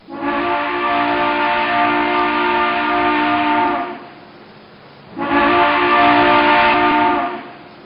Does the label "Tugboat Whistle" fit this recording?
Yes